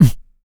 PERC, MALE PERC

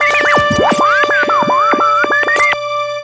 Ambient FX, Ambience FX

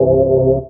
DM ORG1, Dance Mega Synth

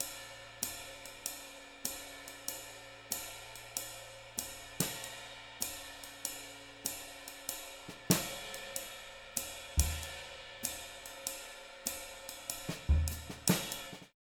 swing, drums; Sampleheads; Peter Erskine; Living Drums

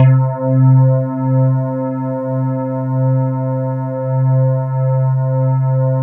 E-HARP, DX HARP; Wizoo - Powered DX

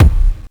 KICK, Hip Hop

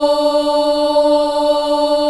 D3 CHI OH -L, Choir; USB Soundscan vol.28; Acoustic & Synth; AKAI

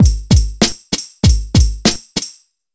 97 BEAT, Pure Electro; USB Soundscan vol.03; AKAI

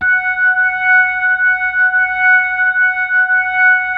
F#5S, B3, Vintage Keyboards

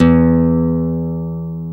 NOTE, Acoustic; Sound & Vision; Gigapack I CD 2; GUI ACOUST.; GUI Acoustic 1

